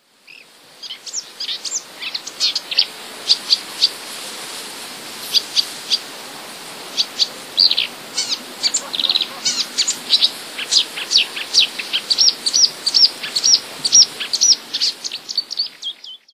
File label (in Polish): lozowka, łozówka naśladuje jaskółkę dymówkę; wroblowe; zapozyczenia